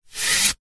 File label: wood long branch pole slide hand; foley